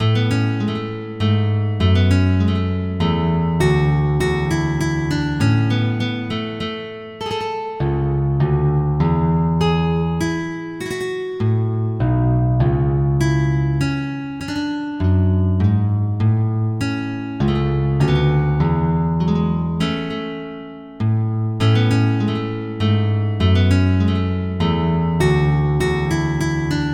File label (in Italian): Tempo di Menuet